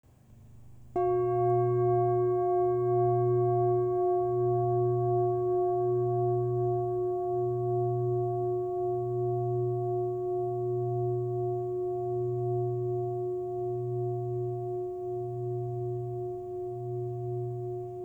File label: Manjushri 3514hz